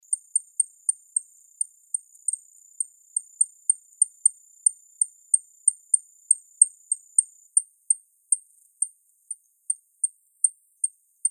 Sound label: CALLS, Emberizids; FSSP; deviche